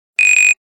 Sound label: Buzzer; Nokia N93i